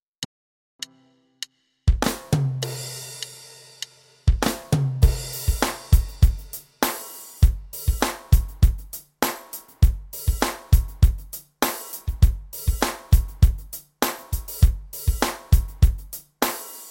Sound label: beat